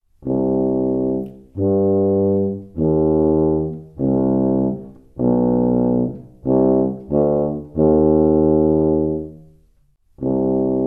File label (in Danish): tuba; royaldanishbrass